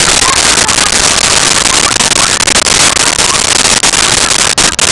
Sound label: laugh